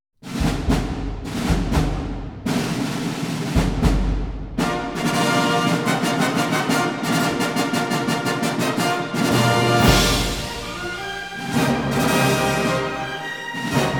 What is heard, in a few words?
Fanfare, Orchestra